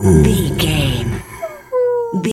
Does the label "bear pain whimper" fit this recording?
Yes